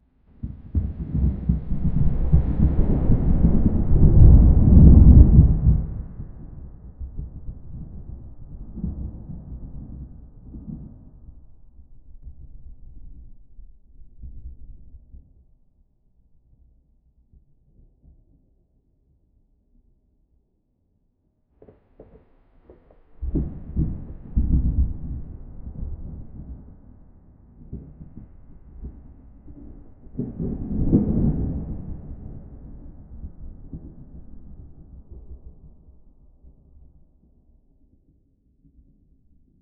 thunder with isolated

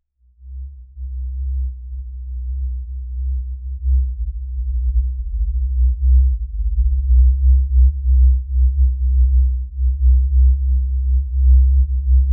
low sustained synth tones; minimalist

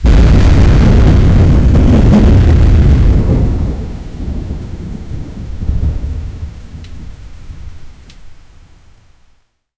heavy rain storm thunders; clap

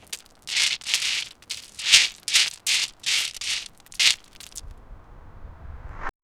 cat leap pebbles, foley

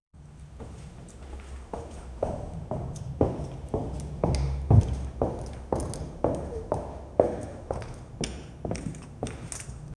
echoing footsteps on hall, hallway

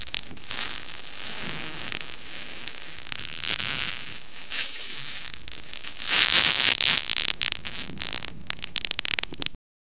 stinky noise, disgusting

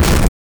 gravelly painful roar, damage

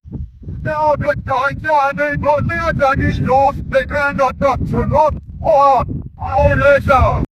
wide desert battlefield

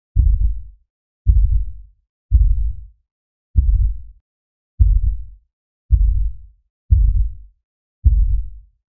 shadow pulse thump deep

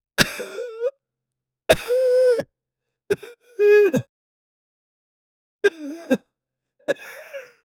male soft cry; hurt

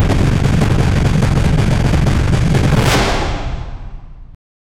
deep rapid metallic thudd, gunfire

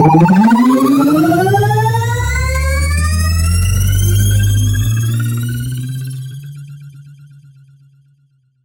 short energetic sound eff

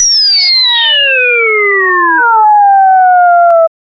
cycle falling sound

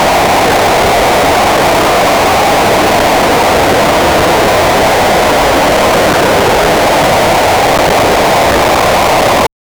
unpleasant static like; noise

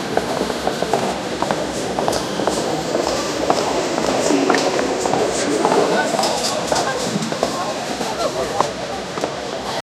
chaotic streetwalk, traffic

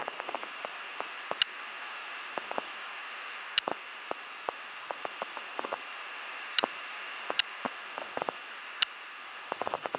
hissing air radio static; sparks

